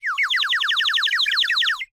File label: Bird whistle, clay, painted, water, airy, wispy, phrase, excited, confident, rapid; Eiravaein Works; Vaeyan IV